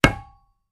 Metal Impacts, Dull; Dull Light Impact With Ring Out. - Construction; pipes